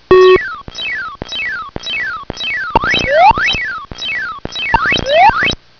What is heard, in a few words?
Power Pill; video game sound effects; Pac-Man